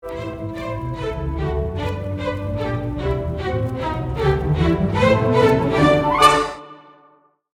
March 3 Layers; Rule of Three; The Nutcracker

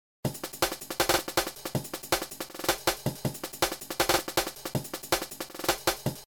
Two Bar Loop, drum; drum kontakt; advanced drum editing in kontakt

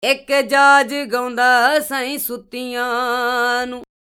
DI Dry

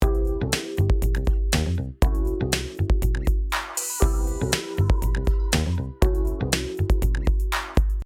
Multiband, mastering; 3D Mixing

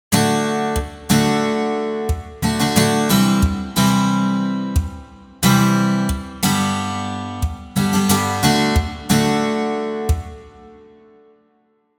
I bVII IV I; altered chords

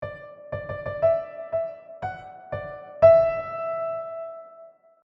1 Layer Melody, a solo melodic line; Rule of Three

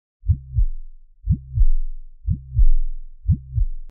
BPF Filter, heartbeat sound; Absynth

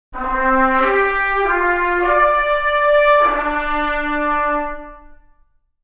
True Legato, cinebrass

